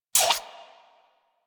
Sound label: Harpoon Gun Mech; Warframe; Sharkwing; Grineer